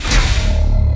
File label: repulsor Pallet start; machines mechanical